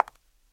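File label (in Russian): одинарный чёткий звонкий звук шага каблука по паркету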